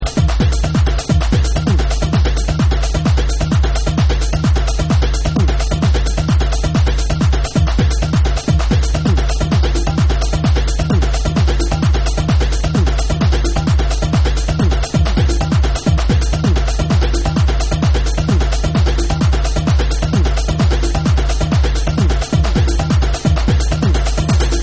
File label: INTENSE BELLS